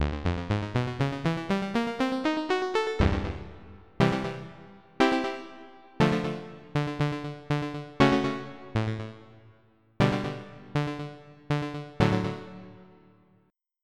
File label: Pong2; Onj's Soundfont